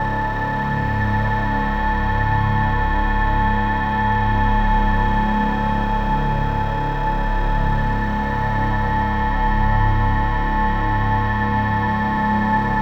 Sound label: Breakdown Loop; Drones Abstract